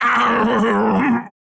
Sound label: Desert Pilgrim Death